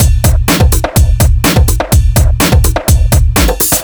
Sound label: Off Beat A